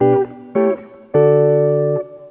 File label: Sound jazz bit